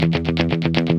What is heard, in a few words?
GUI, 120 BPM